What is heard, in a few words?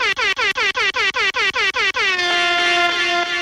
Reggae Sms Effects